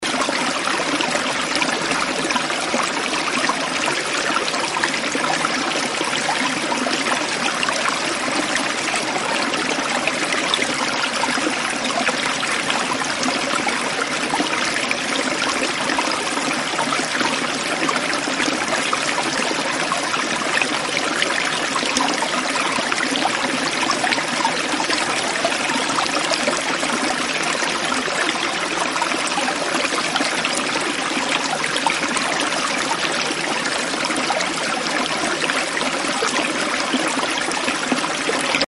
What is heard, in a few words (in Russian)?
jurchanie ruchya